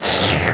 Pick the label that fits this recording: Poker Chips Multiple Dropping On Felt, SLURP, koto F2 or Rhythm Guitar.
SLURP